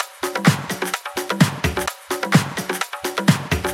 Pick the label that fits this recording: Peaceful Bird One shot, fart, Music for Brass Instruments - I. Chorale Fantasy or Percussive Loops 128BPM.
Percussive Loops 128BPM